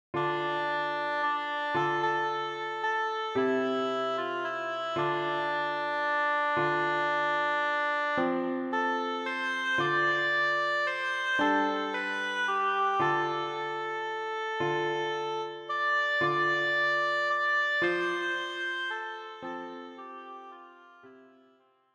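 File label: Scarborough Fair Oboe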